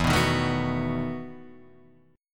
B 7th E; B7/E strummed; JGuitar

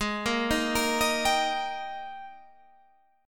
Eb Major Ab; arpeggiated; Eb/Ab

